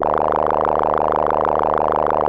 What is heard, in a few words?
700 SYN1 F#2, Korg; Back In Time Records; Korg Universe VOL-1